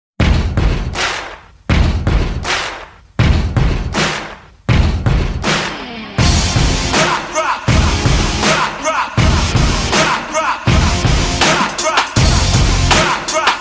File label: Bass Beat Tone